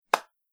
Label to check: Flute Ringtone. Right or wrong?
Wrong